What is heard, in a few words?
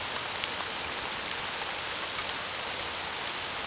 rain; Pirates Of The Caribbean